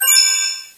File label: Sfx Computer Email